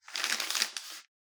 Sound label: page turns; soundscrate